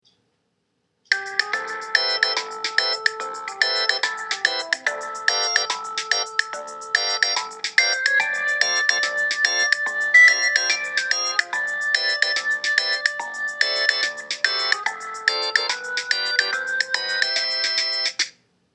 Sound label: By the seaside